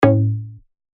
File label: A, bass shots; Soar; revealed bass shots vol 4